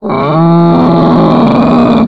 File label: alert, zombie poison; hl2